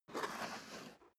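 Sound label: step boots concrete slide long, footsteps; menschen; schritte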